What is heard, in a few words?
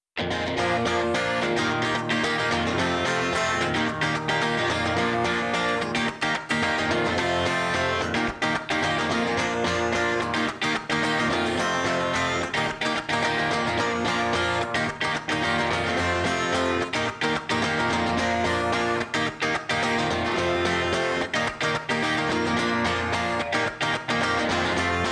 guitar